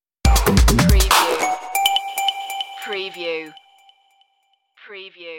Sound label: step 2 edge stinger